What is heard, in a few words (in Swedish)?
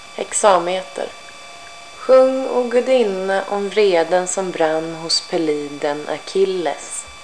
sjung